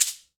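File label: MARACA LEFT, Latin Hand Perc; E-MU Producer Series Vol. 2; More Studio Essentials; Composer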